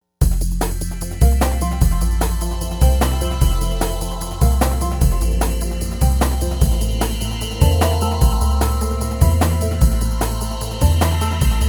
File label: dnb world